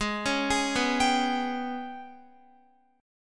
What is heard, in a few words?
Ab-Minor Major 7th Double Flat 5th, down, Guitar; AbmM7bb5; arpeggiated